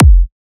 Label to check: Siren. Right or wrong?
Wrong